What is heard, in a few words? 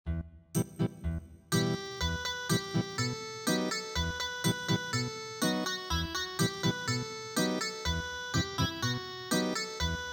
Ethnic Guitar, traditional; The Old Chishomlm Trail